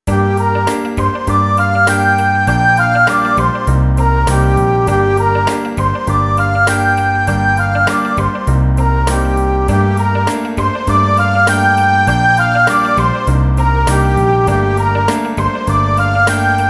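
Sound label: Pentatonic in Gm Pop Ballad 100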